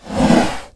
battlemage attack, voices

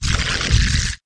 dragon attack; megaglest

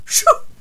princess attack; megaglest

